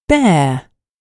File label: bear